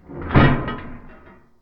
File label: DOOR Metal Hollow Deep Close; mono; Universal Sound FX; DOORS & GATES